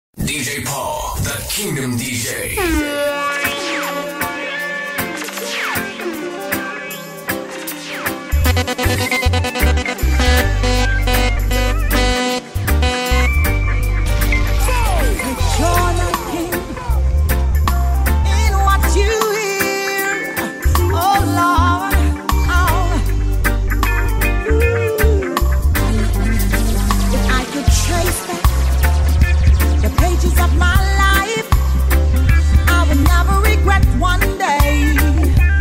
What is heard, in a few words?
sweet gospel reggae worship music mix